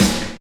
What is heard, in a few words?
Snare, Percussion Hits, Snares